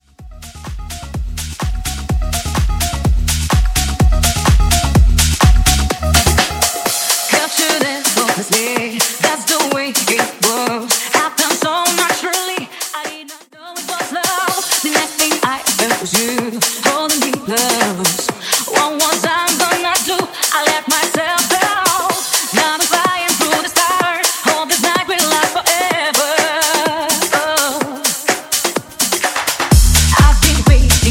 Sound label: Funky House Mix